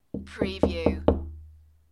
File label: Knock On Door